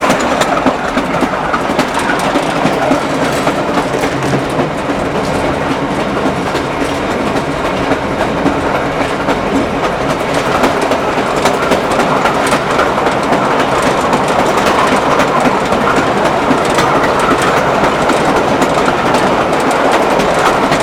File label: Train Running